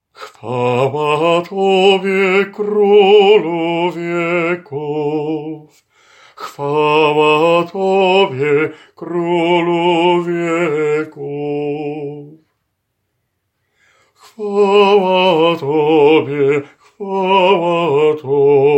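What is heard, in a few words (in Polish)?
Chwala Tobie ALT, choir alto part; Glowacki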